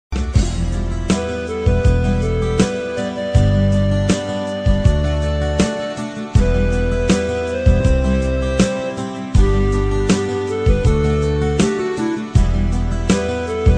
piano love

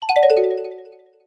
iphone 8 sms tones; apple

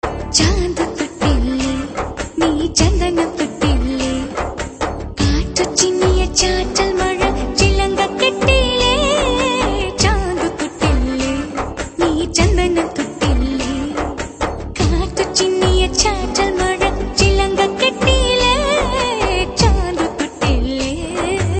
malayalam romance, ringtones

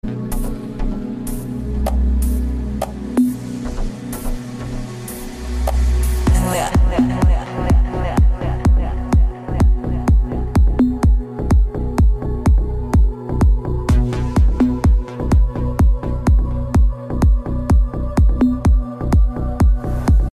dj arabic nights, ringtone

